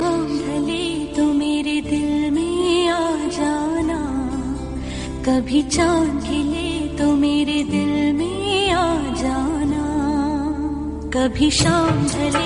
Female Song Ringtone

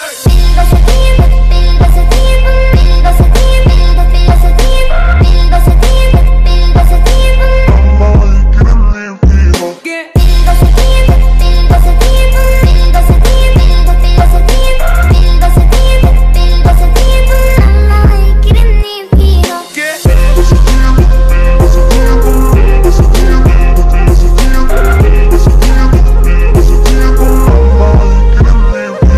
Arabic Ringtone

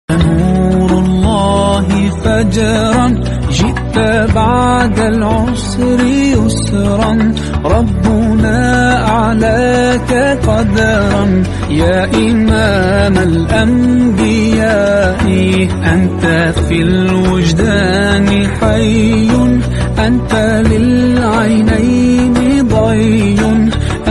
Arabic Naat Ringtone

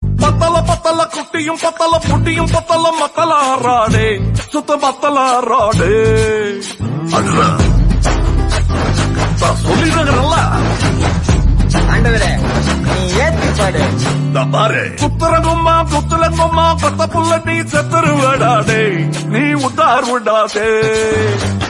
Tamil Song Ringtone

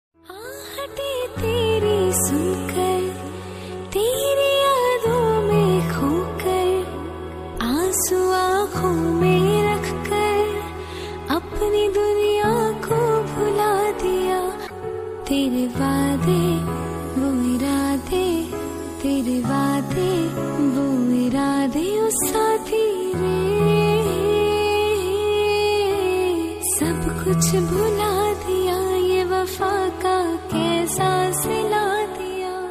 Female Version

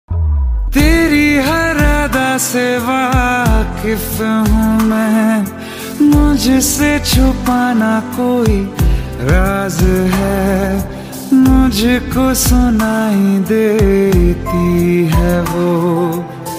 Love Song Ringtone

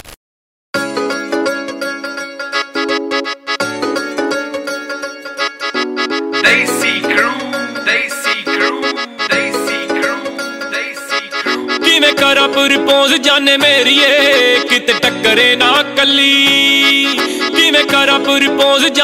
Punjabi Ringtone; ringtones

